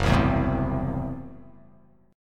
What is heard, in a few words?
F sharp Minor 7th Flat 5th, guitar chord; strummed; JGuitar